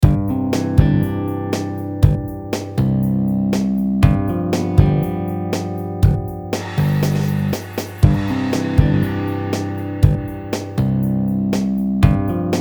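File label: G 120 guitar groove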